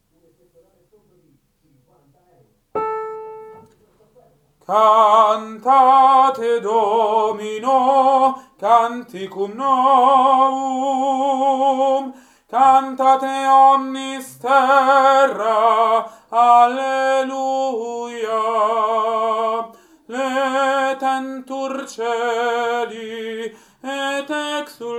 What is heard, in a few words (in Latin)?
Cantate Domino Tenori